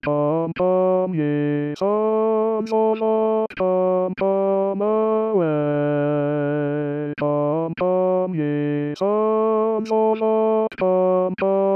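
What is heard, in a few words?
chorus bass; purcell; ode 1694; come ye sons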